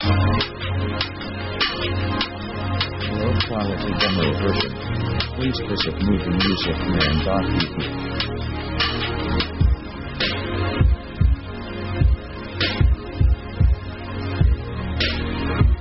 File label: fusion slave; synth themes